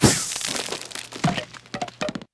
burn; Crash Bandicoot The Wrath Of Cortex; XBOX

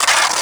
bail board; skater; Tony Hawk's Project 8; XBOX

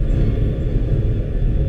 engine room; hongkong; ambience; Soldier Of Fortune II Double Helix; XBOX

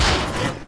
whl down; Cars; XBOX

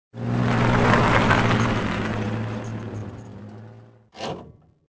truck; Soldier Of Fortune II Double Helix; XBOX

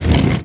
wood slide; Tony Hawk's Project 8; XBOX; moveable pieces

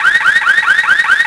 car alarm; auto; Tony Hawk's Project 8; XBOX

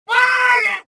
choke

